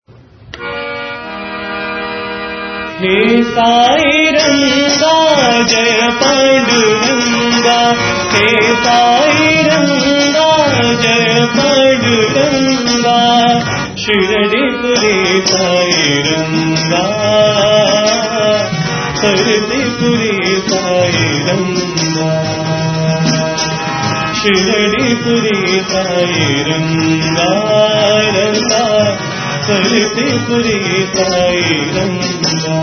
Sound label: Bhajan; Hey Sai Ranga